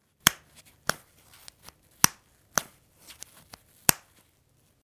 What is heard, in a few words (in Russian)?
zvuk zaklepki